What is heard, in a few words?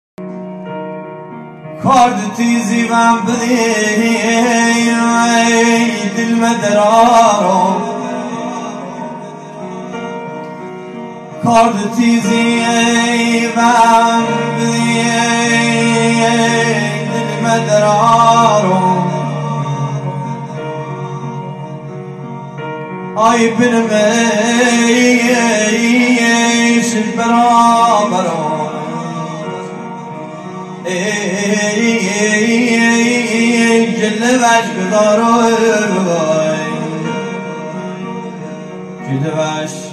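Lori romantic music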